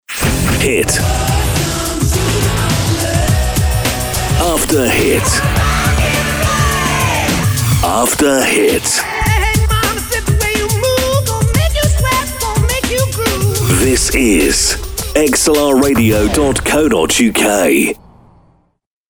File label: Music Sweep